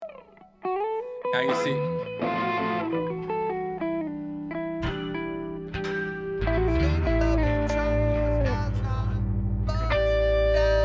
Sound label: Loud Mix